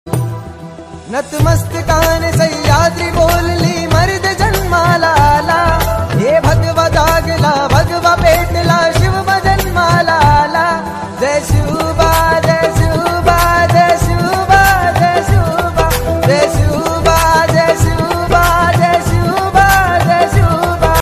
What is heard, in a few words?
ringtone; shivaji maharaj